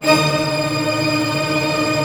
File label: STR TRM A, STRING TREM; Sound On Sound - Peter Siedleczek's Orchestra